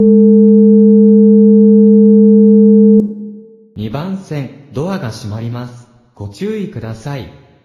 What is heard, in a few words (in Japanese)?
2番線ブザー